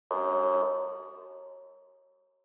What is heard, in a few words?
Police Car Siren Far Buzzer